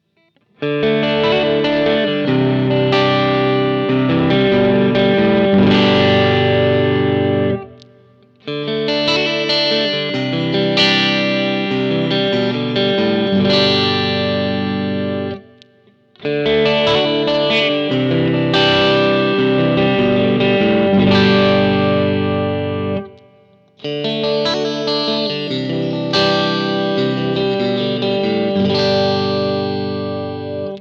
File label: clean arp